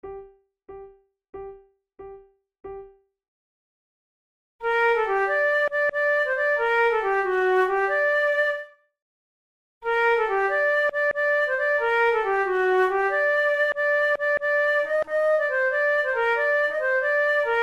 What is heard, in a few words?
flute